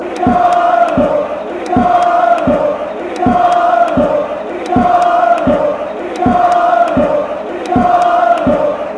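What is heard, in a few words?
ricardo, football chants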